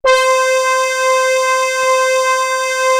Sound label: ARP, Analog Fantasies; BIG RASS; USB Soundscan vol.49; AKAI